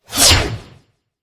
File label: win sweep Vertical; netent